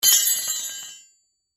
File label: Metal Hooks Drop; Foley; SoundEffects-Blastwave